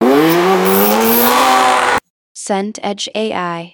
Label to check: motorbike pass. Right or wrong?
Right